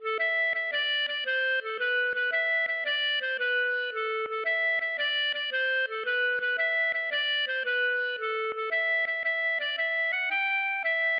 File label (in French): riquenie; loudeac